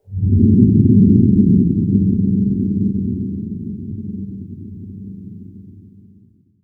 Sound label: C1, Synth Line